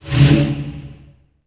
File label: Percu; alone in the dark 4